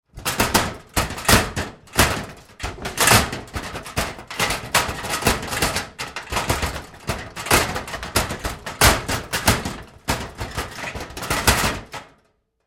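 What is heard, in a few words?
Door Locker Handling Moves Close Up Hard, Metal Door; Doors; Big Room Sound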